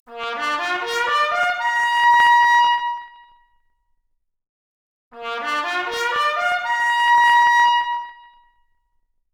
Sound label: Trumpet arp, Stereo output noised; 3bit